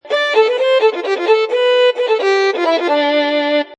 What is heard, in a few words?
Fiddle Melody2 Loop Dmajor 130Bpm, Fiddle Loop In D Major At 130Bpm